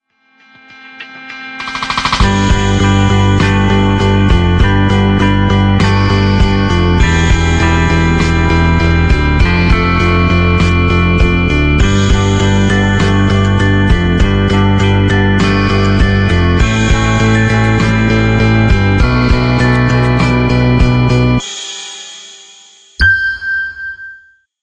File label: sony xperia p ringtone